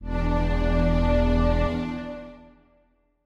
Strings

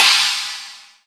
cymbal; osu

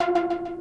synth; osu

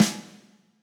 snare

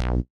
B2 bass

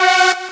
lead

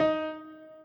piano intro

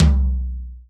Tom 2

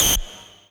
lead

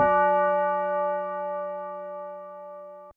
bell F#4; osu

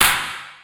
Drum Clap

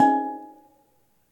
Steel Drum Ab5

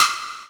Hit Hollow

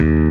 bass

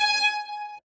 ORC G#6

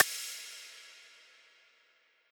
piano layer

